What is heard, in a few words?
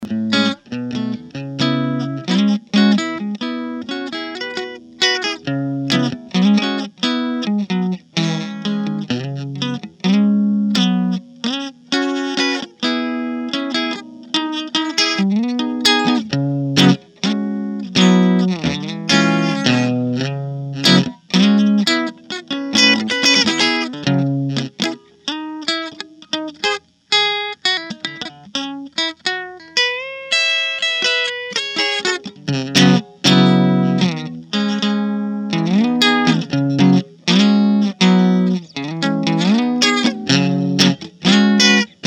bridge guitar